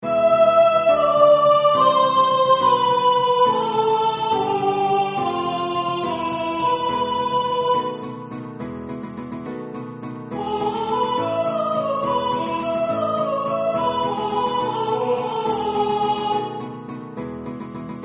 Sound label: SopSolo; Los Pastores